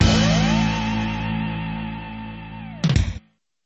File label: Game Over; Biker Mice from Mars